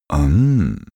male enjoying hmm; koerpergeraeusche; menschen; soundpool

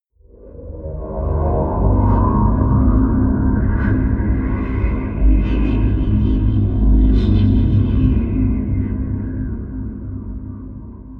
scifi wind wobble hiss; atmosphaeren umwelt

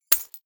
key ring large drop parquet

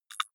click hard low, signale

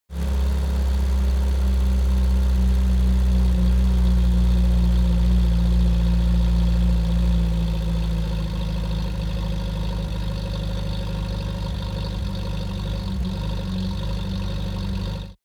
car uaz 469 slow decelerate

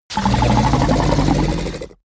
fishman snort gargle aggressive; kreaturen

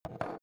metal small wood put down short; industrie wirtschaft; metall

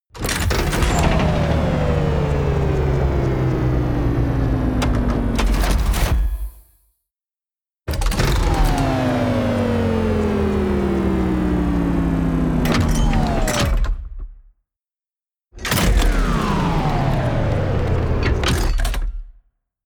turn on off

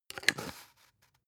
spice jar big scratch close long; industrie wirtschaft; gastronomie

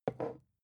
glass put down heavy slow; objekte

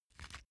small pepper grinder pressured quick; industrie-wirtschaft; gastronomie

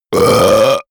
monster burp; comedy-cartoon; soundpool

